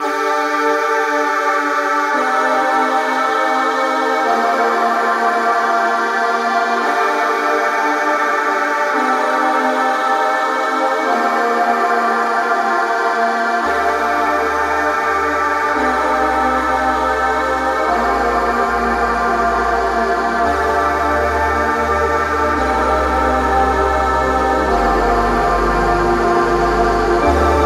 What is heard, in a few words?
MUSIC STORY SERMON